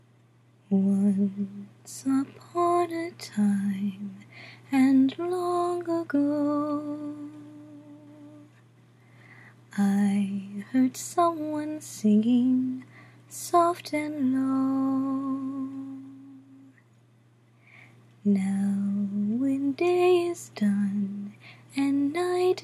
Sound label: Distant Melody, lullaby